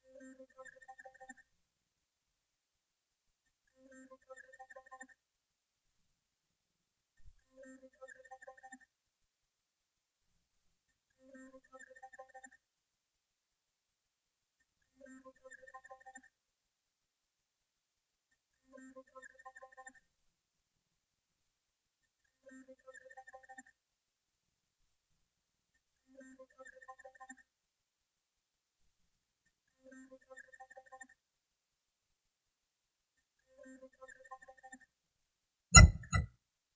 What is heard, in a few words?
bowerbird shrike vulture; Bird song samples recorded locally with a M-Audio Microtrack field recorder; The Bowerbird, The Shrike, and The Vulture; Sooperlooper on Mac OS X